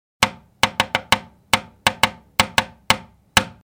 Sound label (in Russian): stuk v okno zvuk